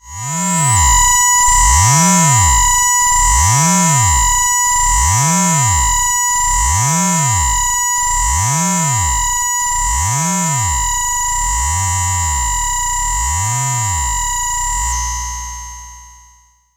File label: NOISE FX